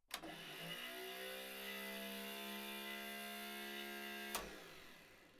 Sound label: starter